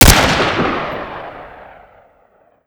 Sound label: magpul; Modern Warfare